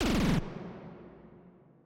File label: womp womp